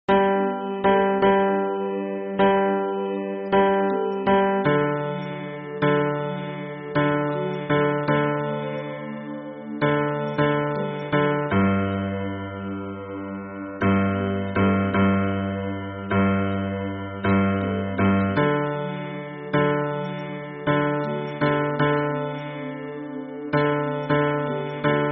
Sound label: hymn bass